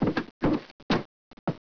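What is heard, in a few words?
warehouse; Caesar 2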